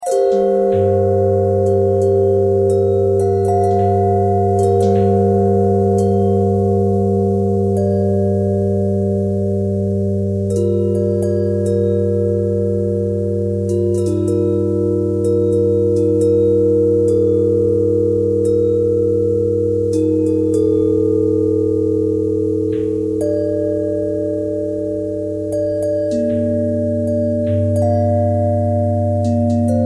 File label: bell thread pentatonic